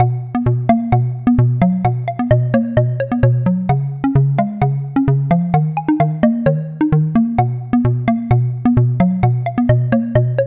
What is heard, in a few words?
Pluck, Sylenth1